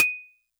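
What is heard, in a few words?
Xylo Snare DX100 D sharp 1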